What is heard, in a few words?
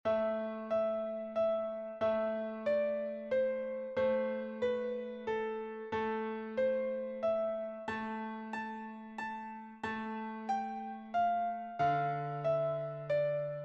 piano